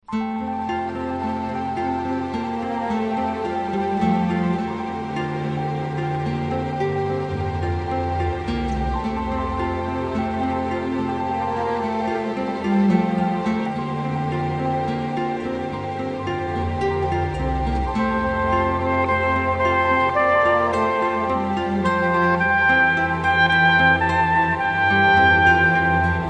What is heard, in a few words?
Majesty Strings